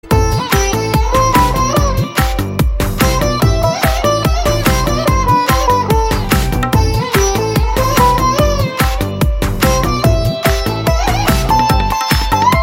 Native Place Love BGM

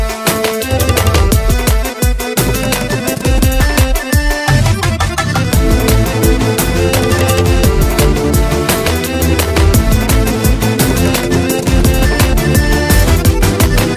BG Tone

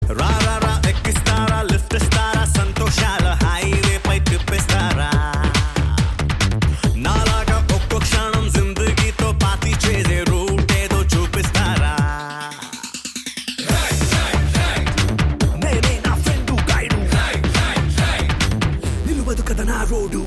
Ringtone

